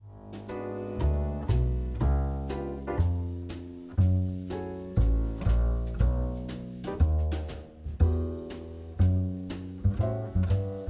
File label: Ambient Coffee Shop Mix; Coffee Shop Playlist; آهنگ های مخصوص کافه